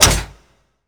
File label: ui hud level up click; LoL